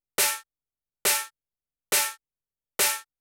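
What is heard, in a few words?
POWER snare drum, Techno 138 BPM; Best Service ProSamples vol.54; AKAI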